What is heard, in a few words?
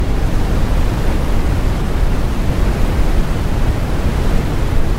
brownian noise; OpenValley